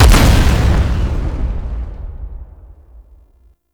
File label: Delivery Key Explode; Gameplay; Warframe; Nineteeen Ninety Nine; PvPvE